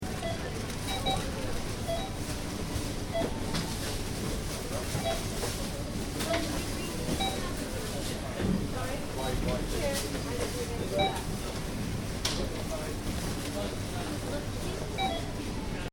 Interior supermarket, Supermarket, Interior: Ambience, Tills Beeping In Background; Stores and Malls; Stuart Duffield